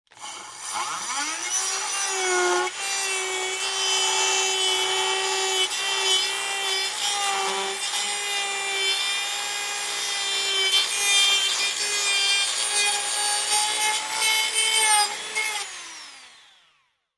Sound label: Dremel tool grinds wood at variable speeds, then shuts off.; Tools, Hand Grinding, Tool Motor, Tool